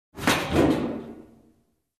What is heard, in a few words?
Warehouse Steel door close